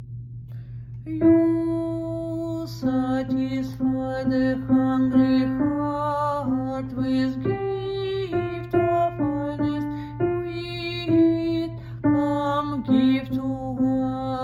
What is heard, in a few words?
Gift of Finest ALTO